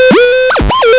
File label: radar